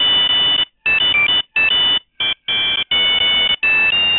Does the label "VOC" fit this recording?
No